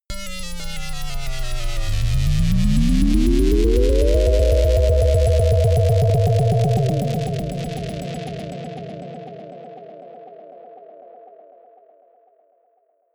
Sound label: atonal rhythm drop riser